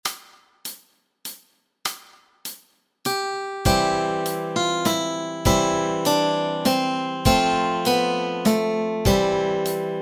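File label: melody and chords, Easy Guitar and Ukulele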